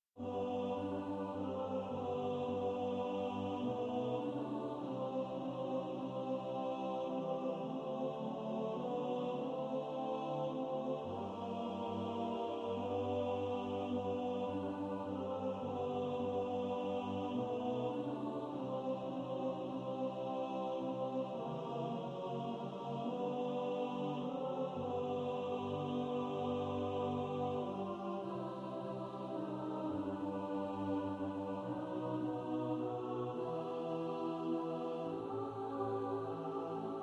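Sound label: alto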